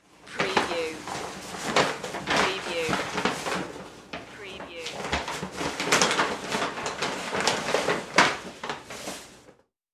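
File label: Grocery Bag Rustle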